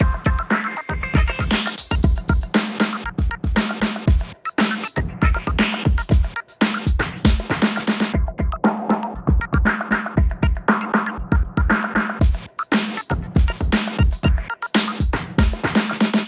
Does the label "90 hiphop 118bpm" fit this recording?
Yes